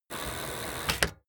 bicycle street brake hard

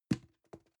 plastic can small fall bounce soft

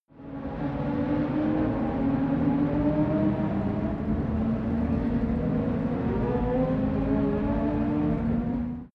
racing car f1 race distant

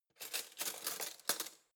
cutlery spoons drawer place soft

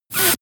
air pressure release

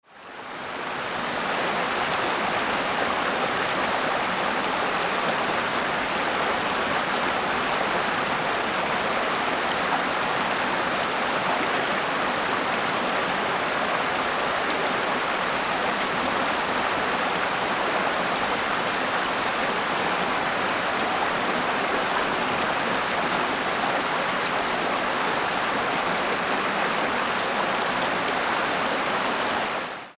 river with rapids

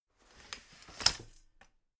folding chair fold medium

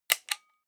small button generic

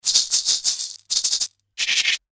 shaker

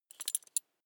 metal belt buckle clatter soft

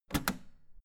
old button harsh ringing push hard

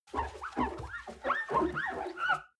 clean window paper towel squeak

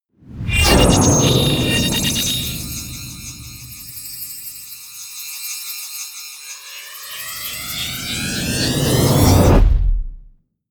promo pad

